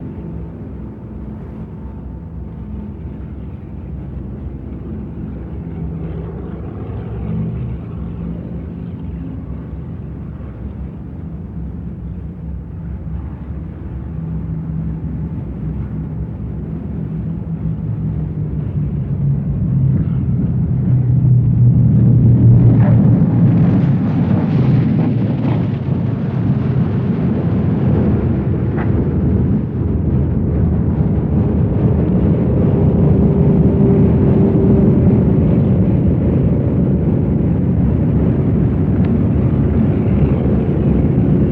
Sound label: B-29 Land Medium Distance, Off At Wheel Hit